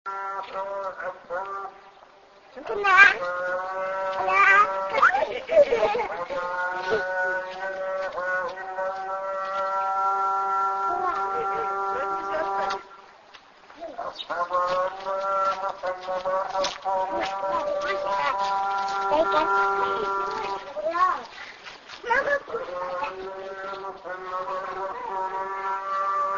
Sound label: azan, yarkend